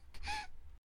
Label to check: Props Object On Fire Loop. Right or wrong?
Wrong